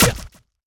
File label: stab; HeavenStudio; forkLifter